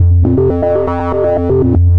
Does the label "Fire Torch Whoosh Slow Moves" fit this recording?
No